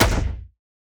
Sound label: Shotgun; GlobalGameJame